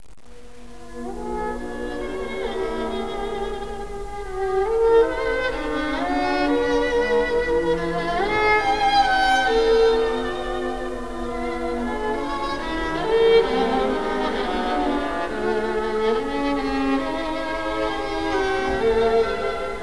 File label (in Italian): allegro moderato